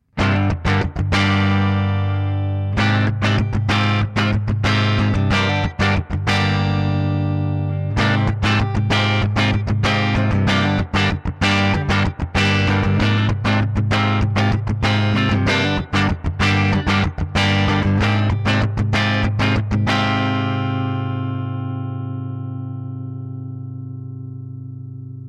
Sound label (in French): clair rythm; rythmique son clair